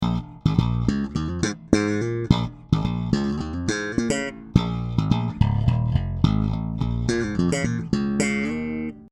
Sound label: both parallel treble boost; GL tribute5 L 2500 ct